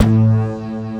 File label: TRANC BASS, HOUSE